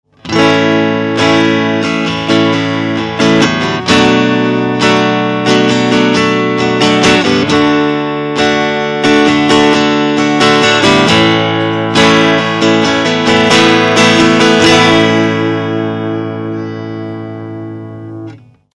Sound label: strat; fender; champion600; clair manche vol4